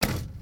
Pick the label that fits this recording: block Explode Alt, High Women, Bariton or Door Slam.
Door Slam